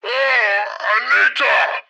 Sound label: hit yell; Blind Drive